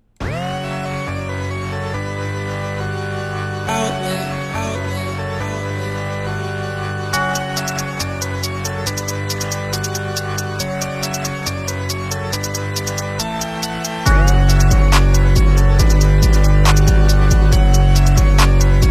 instrumental remix

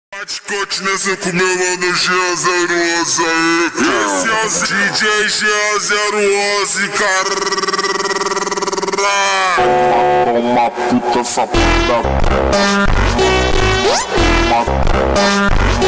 montagem slowed; supercarsedit

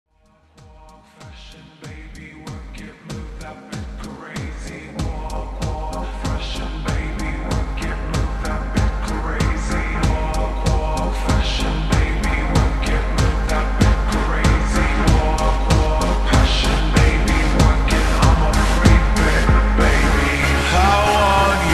slowed reverb

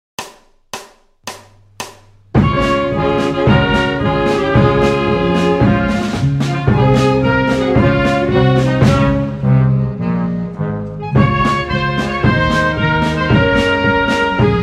karaoke; genclik marsi

